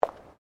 FOOTSTEPS WOMENS LOW HEELS PAVERS STEP

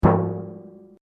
RUBBER ON METAL HOLLOW

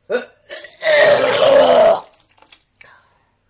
Vomit; Vomiting; Human; Partners In Rhyme

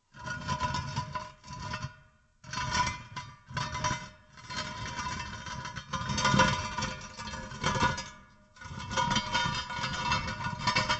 METAL ON STONE LARGE SCRAPE; Metal (Sound Effects:Materials)

